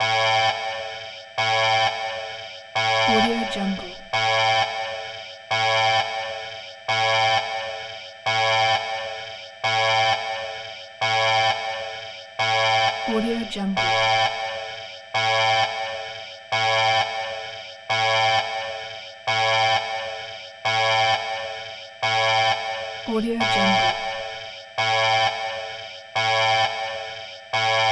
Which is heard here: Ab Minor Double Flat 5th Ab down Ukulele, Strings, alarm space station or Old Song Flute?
alarm space station